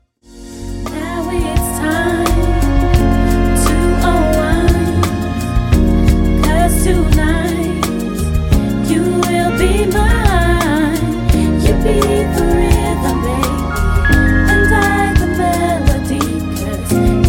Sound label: Rhythm